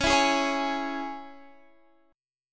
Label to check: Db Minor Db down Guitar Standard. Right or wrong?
Right